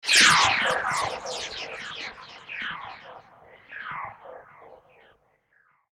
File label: magic; funny